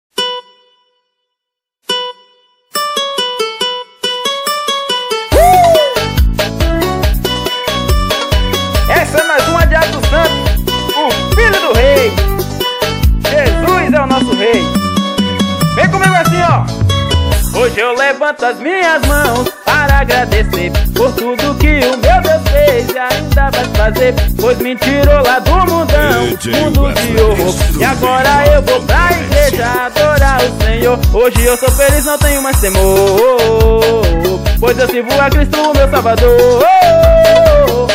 arrochadeira playback

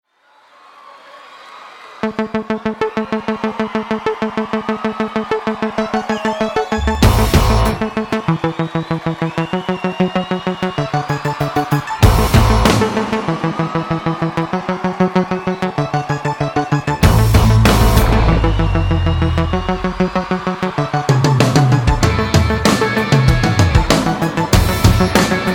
abertura

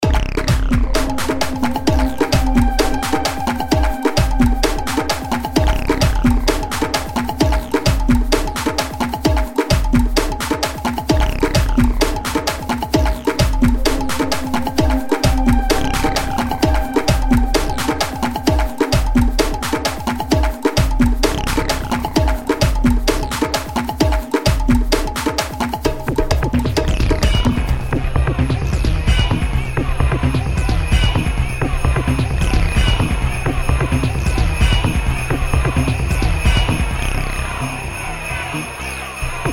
batucada maluca

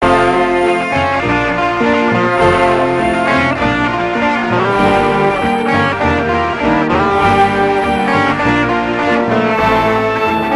blues

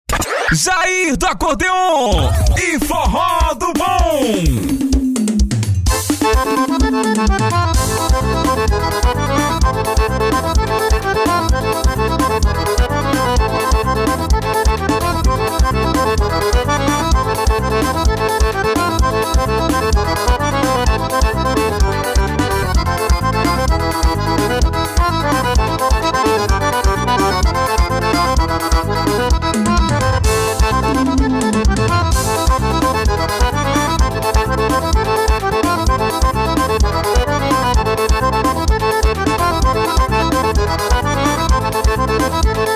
acordeon

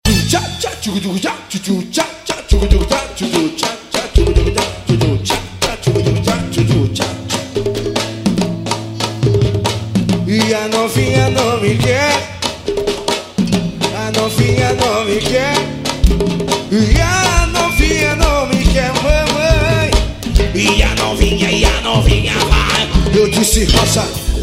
Banda samba

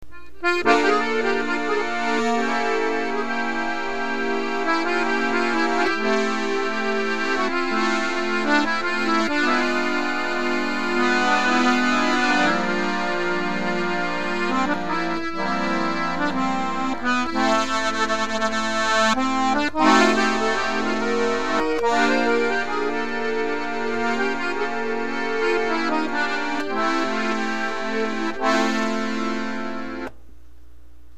acordeon

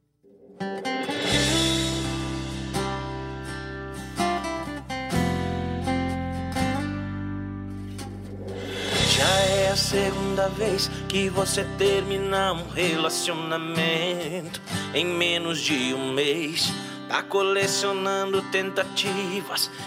sertanejo sofrencia

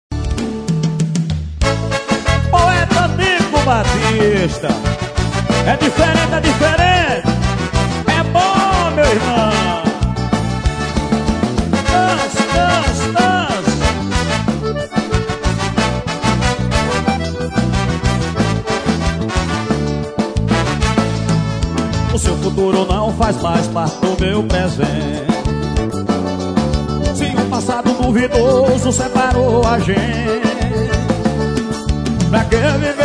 viola

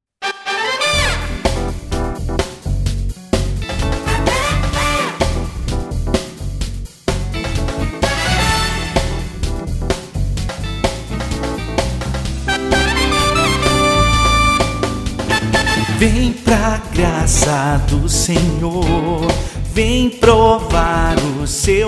vem louvar

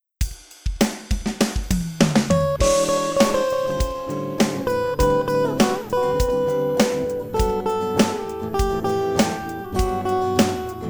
sentença instrumental

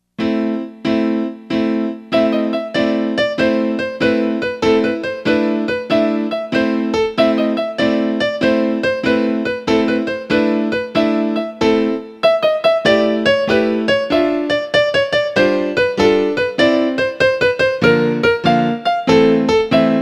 piano

